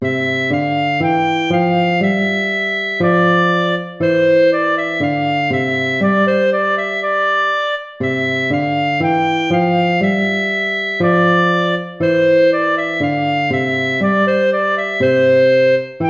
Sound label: gavotta; hook james